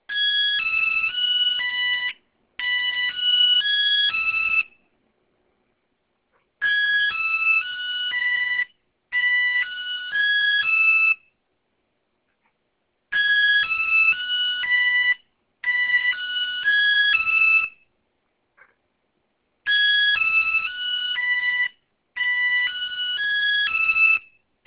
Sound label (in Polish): sigma budzik; centertel; nmt